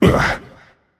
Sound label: grunt hit; smite; hades